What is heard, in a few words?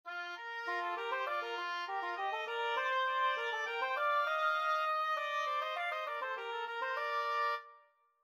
Texture Polyphonic; texture exercise thick and thin